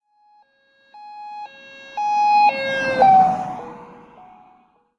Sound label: police; Blind Drive